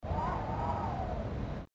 Fishing Wind; LG GD900 Crystal

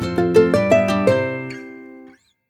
Notification; OnePlus; One-China

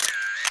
CAM CLICK, camera; RED Hydrogen One

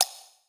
Notification

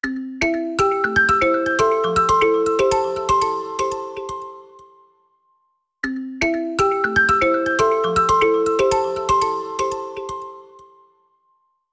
Little Emotion, ringtones; Honor; Magic-8-Pro

